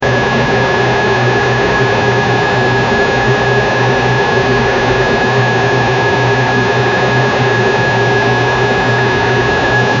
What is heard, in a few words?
reactor start to extreme, overheat